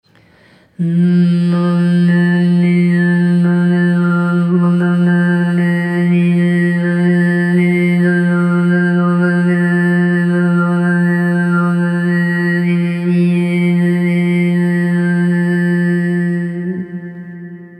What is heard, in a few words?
girl sings digeridoo